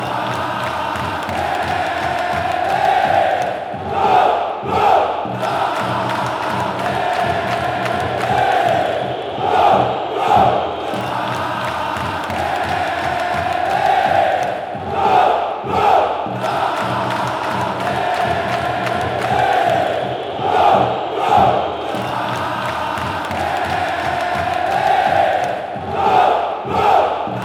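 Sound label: fanchants, football chants; diego tardelli; Chant insulting flamengo and cruzeiro's organized association fans. Mineiro's rivals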